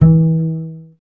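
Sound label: string contrabass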